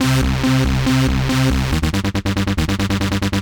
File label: future rave samples, 140bpm, C